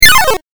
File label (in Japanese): 8bit 下降